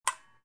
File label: switch button